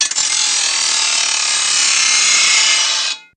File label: Metal Grind Wheel, grinders